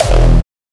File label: Hardstyle Kick E; Drums & Percs; RVRITY